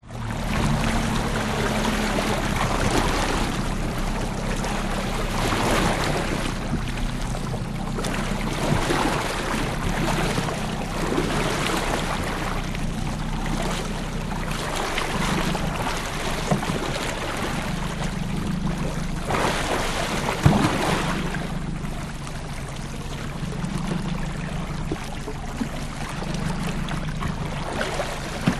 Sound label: transport boat drive sea slight choppy slowly stop start outboard motor 16 ft; fiberglass